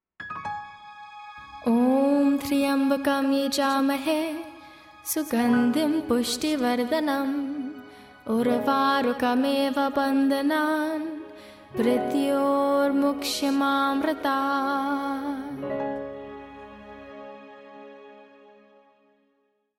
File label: Maha Mruthyunjaya Mantra